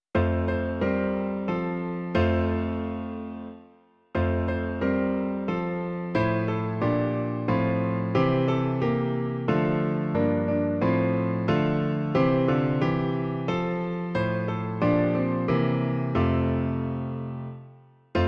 Služebníci, chvalte Boha přesvatého - klavír; Klavírní verze

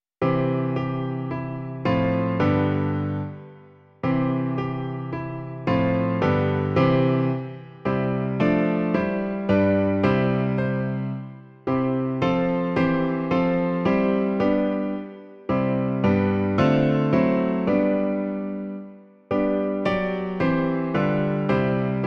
klavír; Klavírní verze

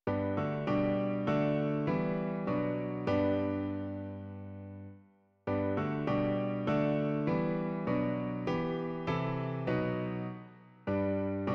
klavír; Buď sláva Bohu na nebi; Klavírní verze

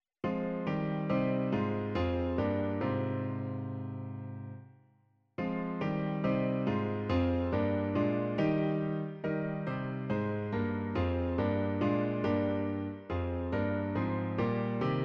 klavír, Klavírní verze; Pane, jenž´s mě koupil sobě

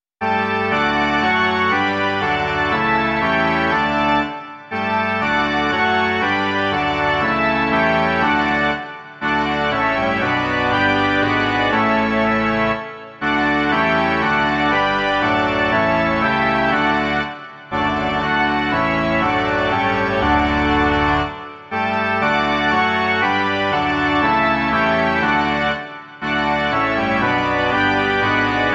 varhany; Ó Ježíši, ty v onu noc

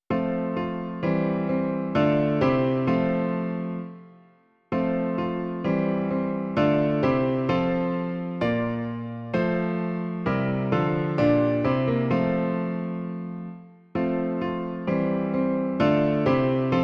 klavír; Král věčný nás požehnej; Klavírní verze

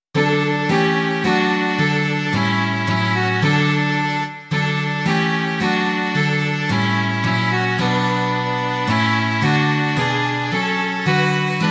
housle, viola a kytara; Jako hvězda v temnotě; Imaginativní verze

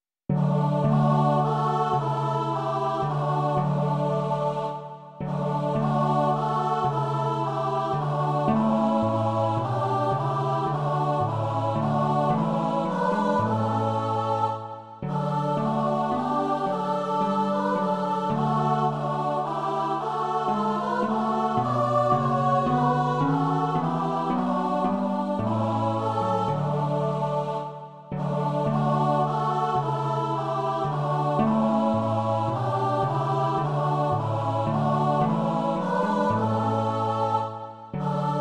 dětský sbor a harfa; Ó Bože, vyslyš nás; Imaginativní verze

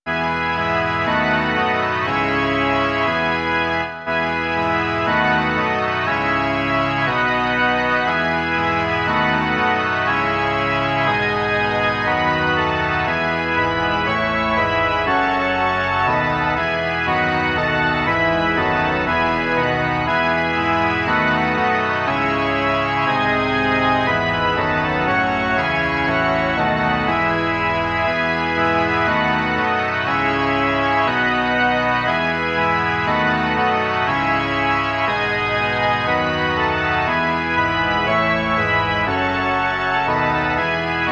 varhany

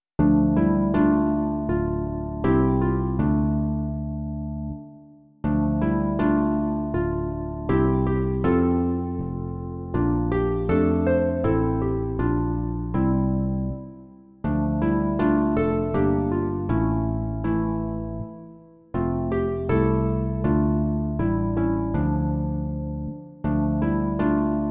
harfa a baskytara; Imaginativní verze

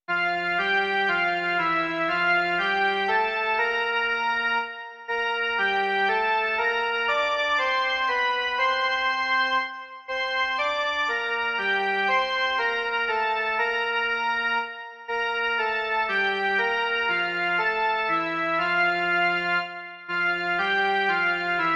Slunce denní již nám hasne jen melodie

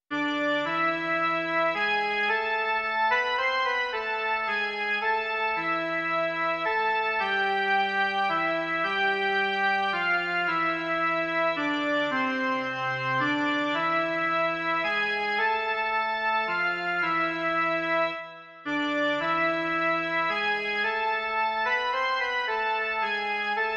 jen melodie; Syn Boží se nám narodil

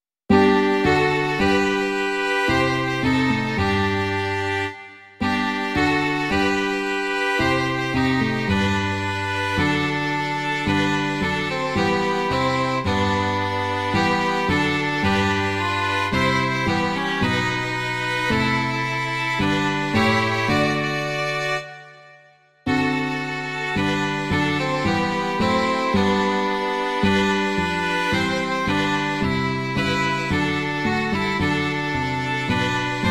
2 housle a loutna; Otče náš, kéž dnes dáš; Imaginativní verze

